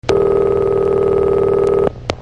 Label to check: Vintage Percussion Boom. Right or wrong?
Wrong